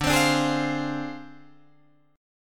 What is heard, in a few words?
D Minor 7th Flat 5th Guitar Drop D